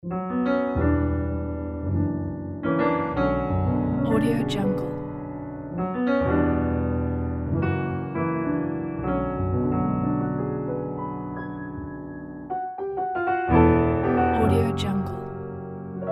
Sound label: classic piano ident